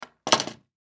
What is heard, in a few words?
Pool Cue Drop, Pool Cue Drop Fall On Ground; SPORTS; Blastwave